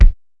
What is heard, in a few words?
Bassdrum, Korg T3